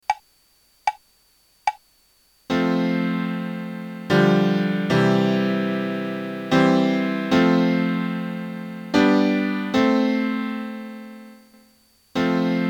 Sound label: Blue Piano